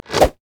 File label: blue mech melee basic attack; LoL